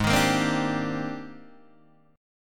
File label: Ab Major Sharp 11th Ab, Guitar DADGAD